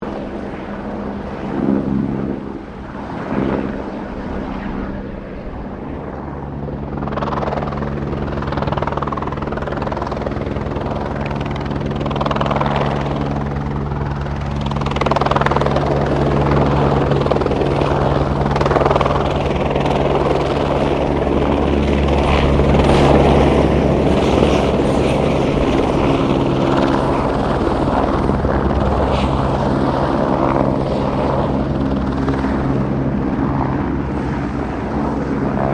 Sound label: HELICOPTER BELL 204 FIREFIGHTER PASSING CLOSE; Helicopter Sound Effects